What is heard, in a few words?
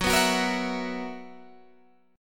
B Major 7th Suspended 4th F sharp